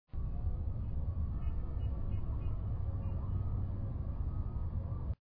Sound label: Traffic